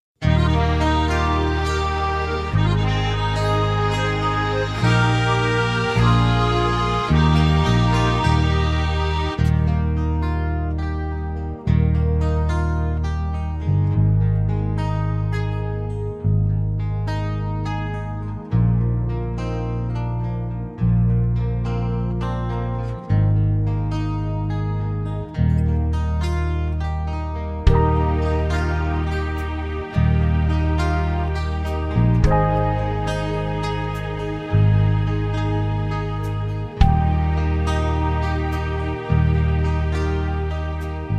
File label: Eb To F No Backing Vocals, backing tracks